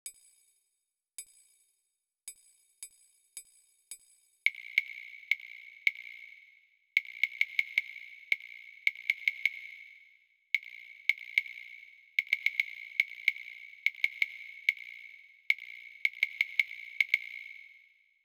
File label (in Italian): dettato ritmico